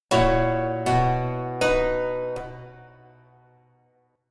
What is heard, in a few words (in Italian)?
Accordo di quarta e di nona